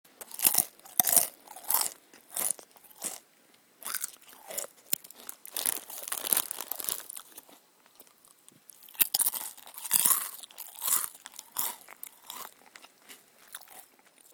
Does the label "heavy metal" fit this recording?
No